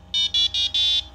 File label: Module Connection Failed - Four variable-tone beeps; Node + Module Sounds